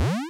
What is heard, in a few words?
cast ready; triple-aaa-games; hud